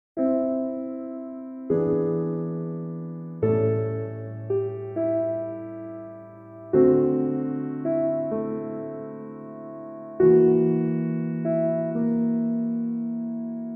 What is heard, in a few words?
Piano Miniatures Autumn Mood